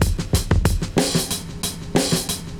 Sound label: Joker, Drum Loops, Vintage; Zero-G Smoke